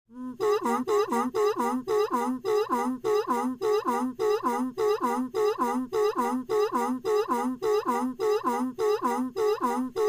Motor Pump Small, pumps

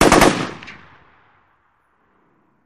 Machine Gun Sngl Burst; bursts

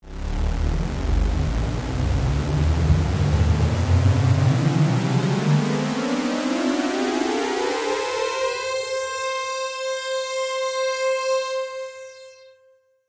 synth efx rise

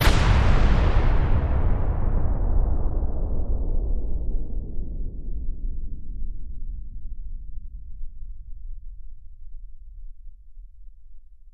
Impact Metallic Ech

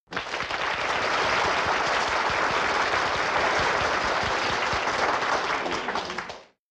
Applause Sml Theater

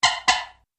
Single Car Horn Honk

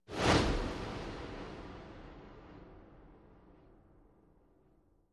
Baseball Bat Swing W; baseball fx & games

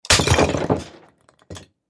Jug Smash

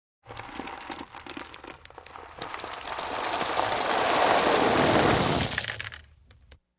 Sand Rock Gravel Pour, rock exterior

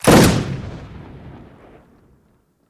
Gun Shot Single Silenced

